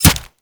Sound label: Kunai Impact Flesh; Warframe; Hit Effects; weapons